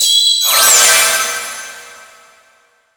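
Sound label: Enemy Hourglass Minion Heal; disney star smash android ios mobile gamerip 2020; Hourgrass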